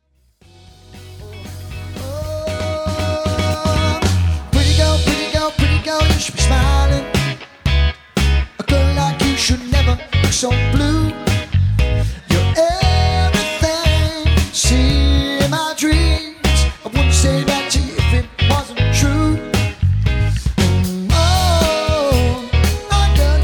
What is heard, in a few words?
Rock LIVE